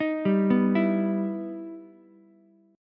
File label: E Minor Major 7th, Ukulele; EmM7 arpeggiated